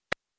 Menu Click Dry

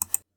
Left Mouse Click

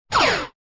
Gun Laser Single Shot Sci Fi

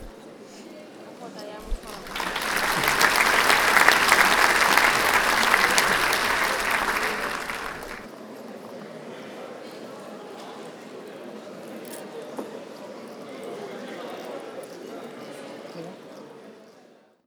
Audience Applauds At The Beginning Of A Show

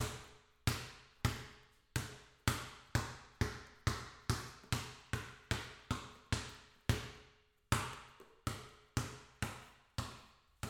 Basketball Indoor

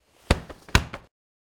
Body Bag Falling

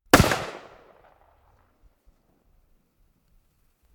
270 Calibre Gunshot

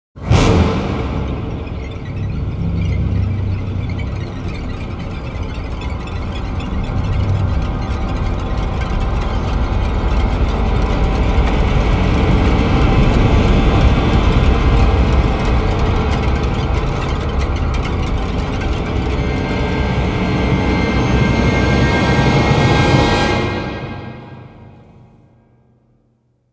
Music Horror Tension

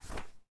Page Turn

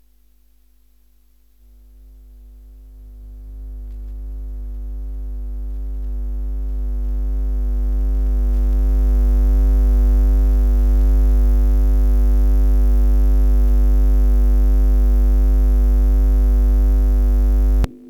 Low Fade In Feedback Loose Wire

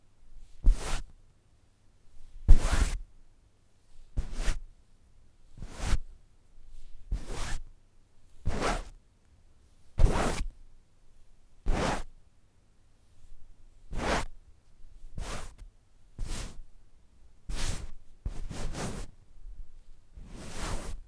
Fabric Touched By Hand

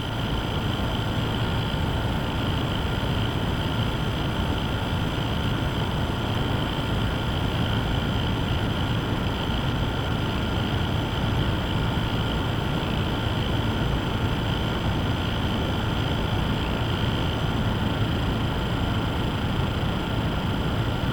Spinning Fan

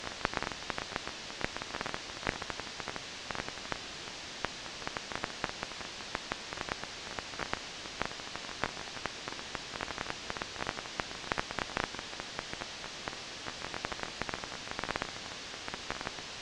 Vinyl Dust Loop Static

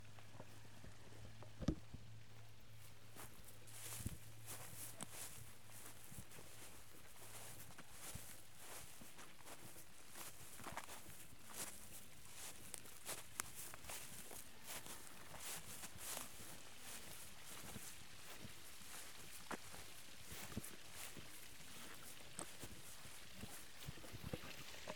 Grass Footsteps Rural

